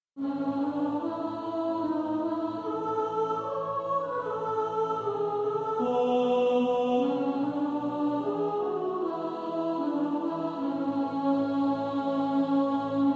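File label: Will There Be Any Stars soprano, choir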